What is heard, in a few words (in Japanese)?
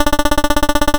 会話; nes retro talk sound effects